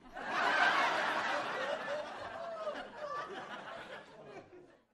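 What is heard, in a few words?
Crowd 50 Single Hearty, small interior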